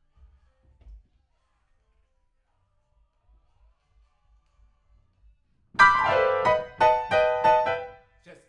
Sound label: PIANO, RNR for piano